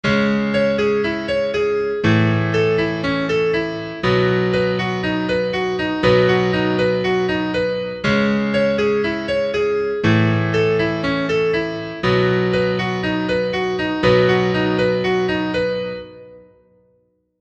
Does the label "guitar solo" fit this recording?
Yes